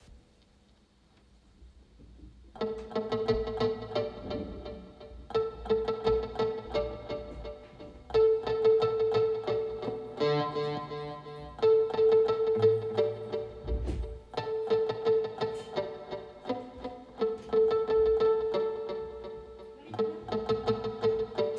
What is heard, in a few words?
your phone rings in another dimension